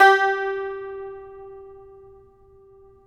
tar; Roland - LA Composer vol. 3

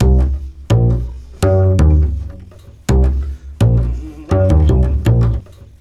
Double bass B 165bpm, Bass Loops; Total Drum&Bass

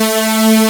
BIG SAW A2, Analog, Synth; E-MU Formula 4000 Series Vol. 3; Analog Odyssey; Synth Builder

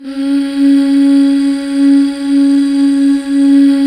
MMHH C, Back.Mmh Fem

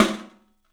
STEEL S, drums; 3D Sound

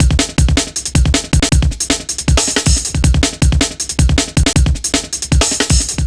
breaks, Loops

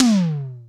Percussion, House

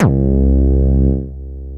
PPG LOW C2, Bass Analog & Synth; USB Soundscan vol.12; AKAI; VINTAGE

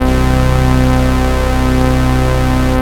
MONO POLY, Bass

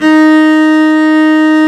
cello, Arco Solo; String Master Series

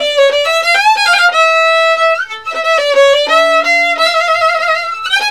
VIOLIN, Balkanish Traditions

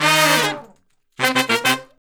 Horn Section

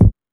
Techno, EMU XL-7

